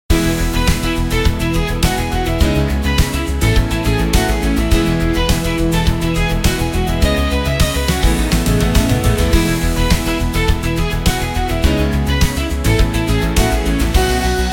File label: 104 bpm Joy Pop Synth; 54 sec; ai social media music